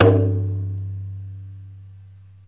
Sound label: deep conga; Western and Latin Percussion; single hits